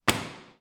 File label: Book Falling